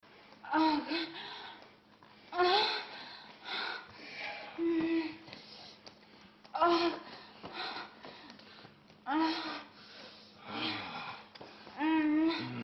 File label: sex, moans; The Witcher Hunt